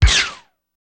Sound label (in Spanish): Explosión Láser Rápida